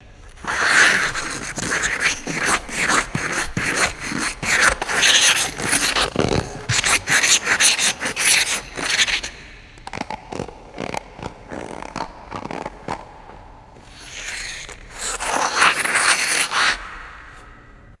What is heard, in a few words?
rapid ice skate blade scrapes during spin